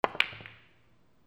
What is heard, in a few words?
BILLIARD